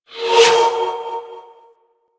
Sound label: Spirit Primary Fire Whizzby C; Warframe; Grineer Thumper Bot; Sentient Spirits